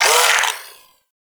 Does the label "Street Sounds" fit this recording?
No